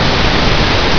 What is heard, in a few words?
pink noise